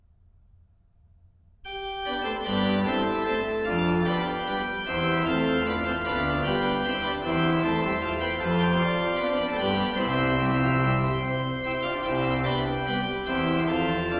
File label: Komm, Gott, Schöpfer, heiliger Geist, BACH ORGAN, Chorale preludes; Orgelbüchlein